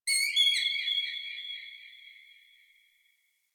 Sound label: Forest Bird High; Warframe; Grineer Forest